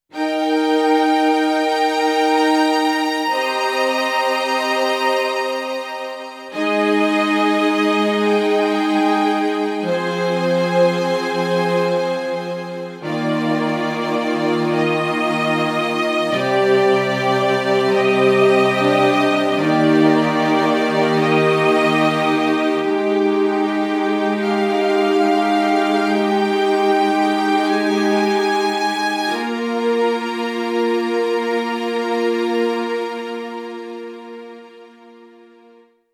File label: Yamaha P525 Strings